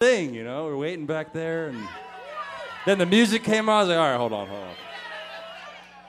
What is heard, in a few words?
Crowd